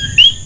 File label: ecoqui